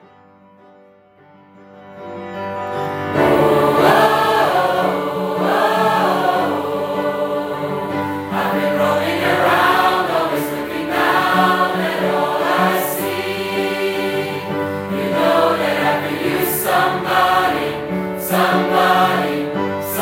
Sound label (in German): 4-stimmiger Kanon